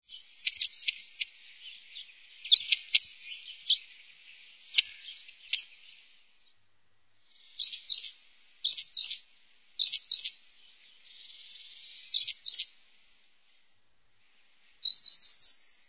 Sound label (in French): mesange a longue queue, chants et cris